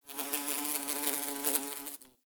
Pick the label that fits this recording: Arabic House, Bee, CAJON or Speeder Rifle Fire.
Bee